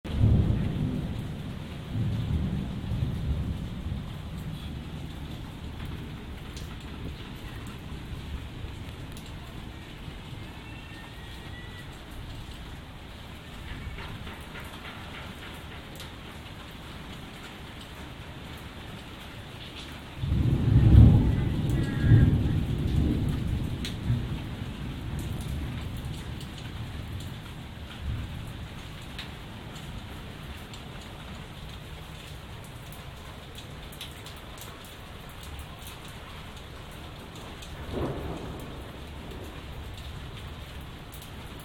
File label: thunder